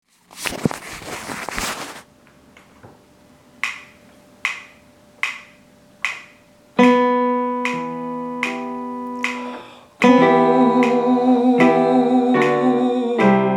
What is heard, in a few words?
tenor